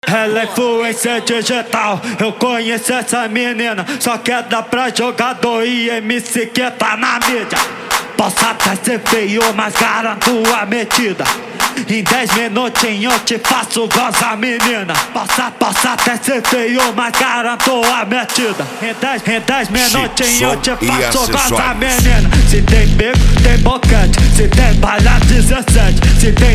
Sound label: especial funk